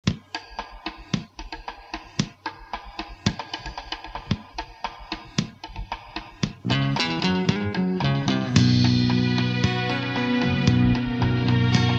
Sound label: instrumental